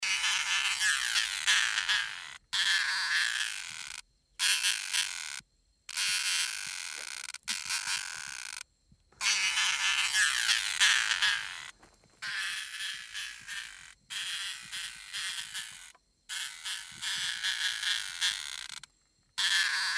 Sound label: sound of insects; Peter Kutin